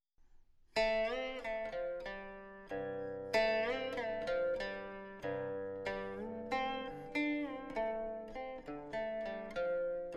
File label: gong